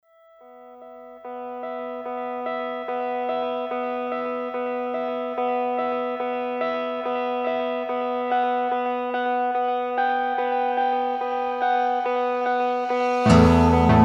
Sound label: Instrumental